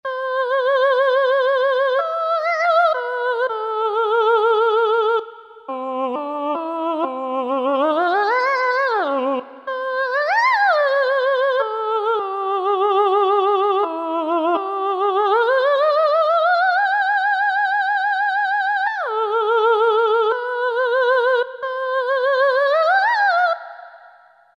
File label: Retro-Singer, Roland V-Synth; Factory Patches